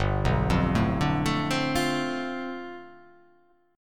G 11th

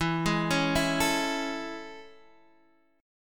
A Major 7th E

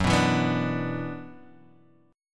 Gb 7th Suspended 4th Sharp 5th Gb; strummed; JGuitar

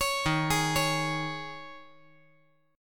D sharp 7th Suspended 4th

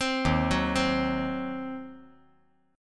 D sharp Minor 6th D sharp down; arpeggiated; JGuitar

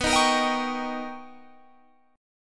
D sharp 7th B, Guitar; D#7/B; strummed; chord shape

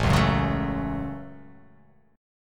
A 6th, guitar

